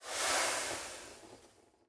Steaming; SoundsCrate

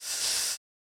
snake hiss

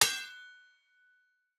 Sword Clang; soundscrate

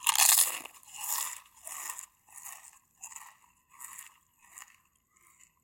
chip crunch chewing open; soundscrate

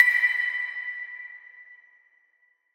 tonal blip; soundscrate

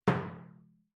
Metal Footfall; soundscrate

